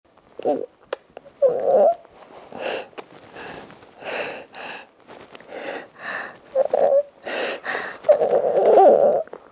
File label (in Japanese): えちサンプル 耳舐め